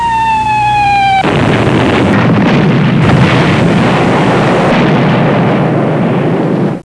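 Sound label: bomb burst; warbirds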